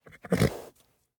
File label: horse grunt; teardown; ps5 windows xbox series xs